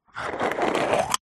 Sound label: cafetiere plunger lift dry; household; zapsplat